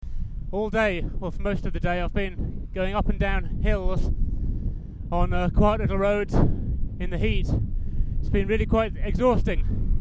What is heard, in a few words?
descent; ocreza